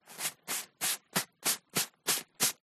Cane Umbrella Large Movement Fabric Cleaning Shake Out